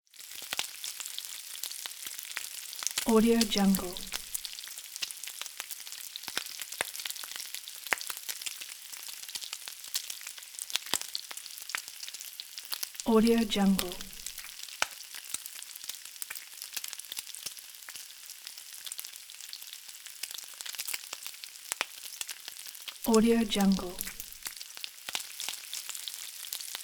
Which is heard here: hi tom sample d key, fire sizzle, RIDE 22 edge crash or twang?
fire sizzle